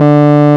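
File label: RMI piano D 2, EP; Sonic Reality - Vintage Timetraveler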